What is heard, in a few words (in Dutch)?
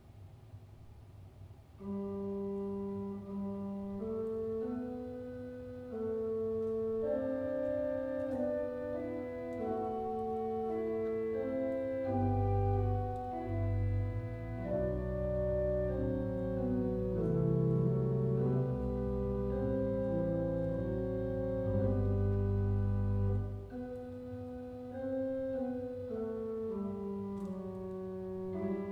orgel; borger; dupre; vor deinem thron